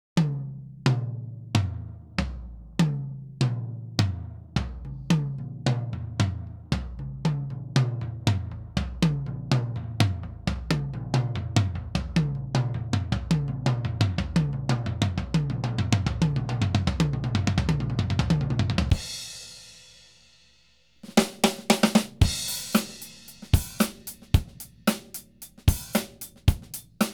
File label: Instrumental, Whole drum kit